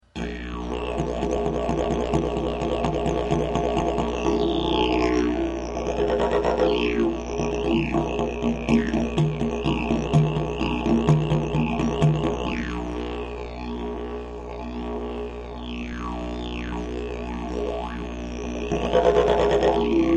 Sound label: didgeridoo